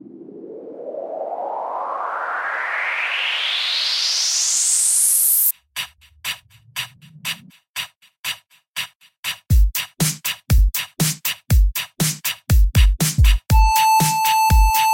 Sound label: strom tune